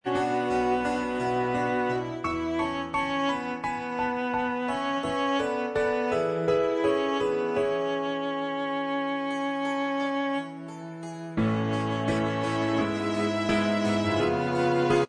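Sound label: jpop instrumental track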